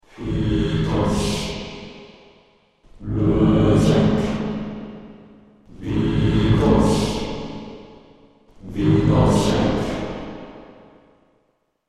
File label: Male Chant; Soundtrack; Final Fantasy 8